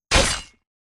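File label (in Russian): меч удар в тело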